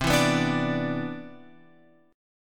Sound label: C Major 9th, C, chord; CM9 Chord {8 7 9 7 X 10} strummed